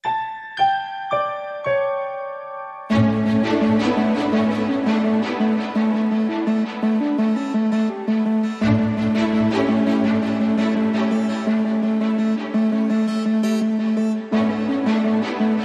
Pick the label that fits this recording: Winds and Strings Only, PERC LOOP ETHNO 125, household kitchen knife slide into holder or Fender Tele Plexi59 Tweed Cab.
Winds and Strings Only